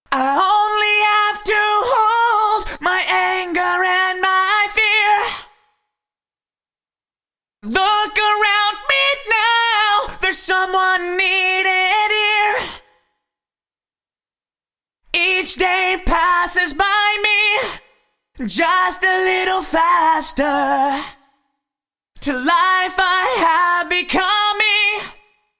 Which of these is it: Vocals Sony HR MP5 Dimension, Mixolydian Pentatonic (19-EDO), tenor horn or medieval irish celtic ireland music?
Vocals Sony HR MP5 Dimension